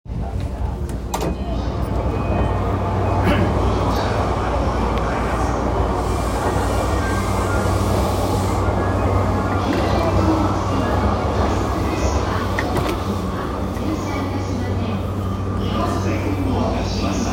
JR山手線西日暮里CW, train sounds

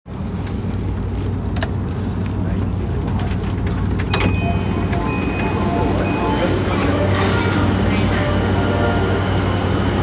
JR山手線西日暮里CCW

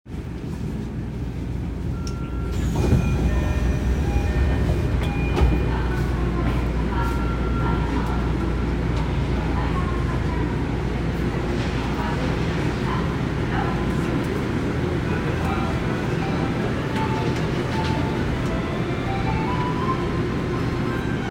南北線市ヶ谷北, train sounds

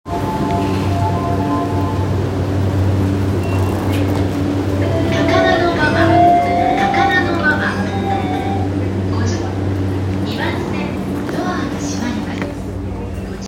JR 山手線 高田馬場 CCW, train sounds

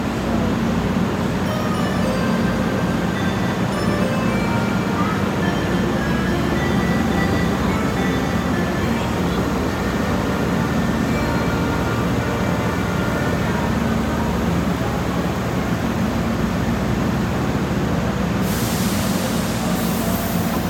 阪急宝塚線宝塚発車音 番線, train sounds

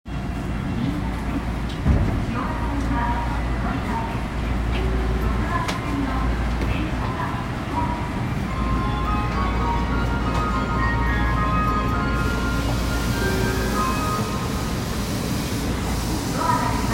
半蔵門線表参道東, train sounds

